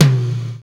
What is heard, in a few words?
tom, Roland; Club-50 - Foundations Roland; Ext. Snare 4